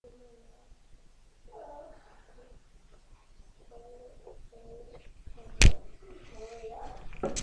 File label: toy